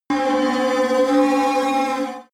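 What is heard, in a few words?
extrinsic drone distorted, energy; energie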